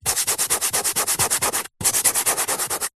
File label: chalk rub erase hand finger chalkboard; office